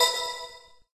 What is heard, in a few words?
Map Dots